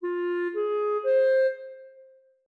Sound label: 5 notes F min